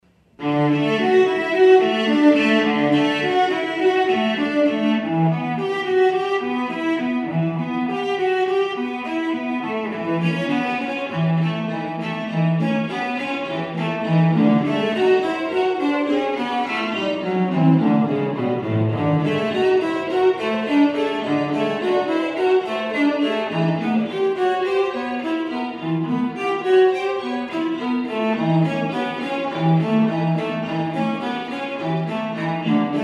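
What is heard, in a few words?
Variation on Cello Suite No.1 by Bach; Progressive Bliss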